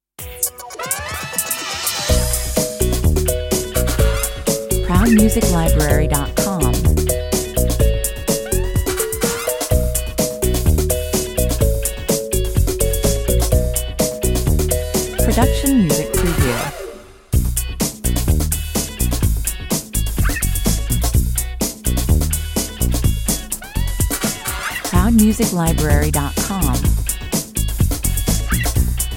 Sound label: bubblegum 126 bpm